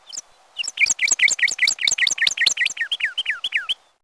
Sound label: cardinal